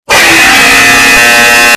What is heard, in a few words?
extremly loud buzzer